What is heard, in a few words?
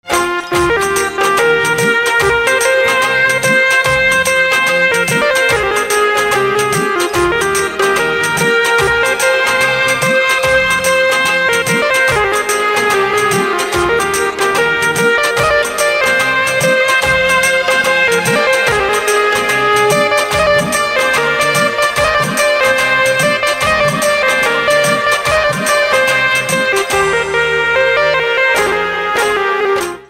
Been